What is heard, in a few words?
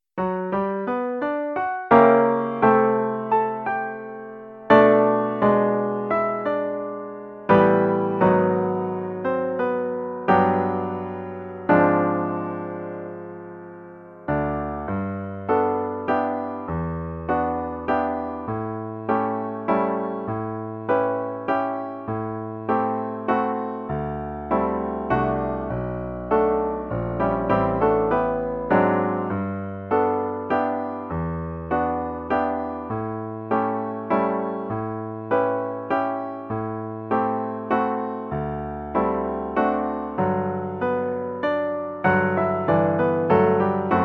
Accomp Only